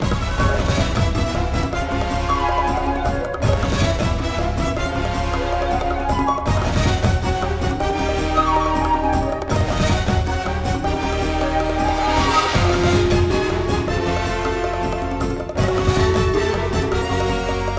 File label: Music Ingame Boss Giant Plant Loop; New Joe & Mac Caveman Ninja